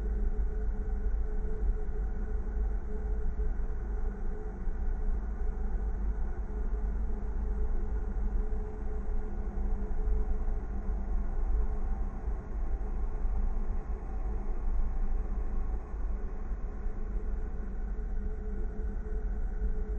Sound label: Drone Loop; Solus Project